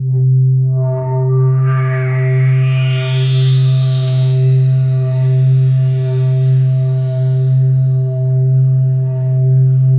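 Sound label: sleeping waveforms binaural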